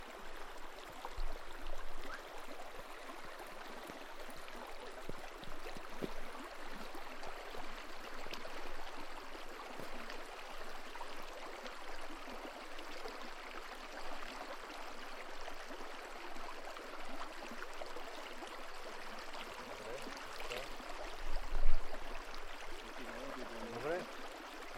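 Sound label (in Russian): поток; nova bana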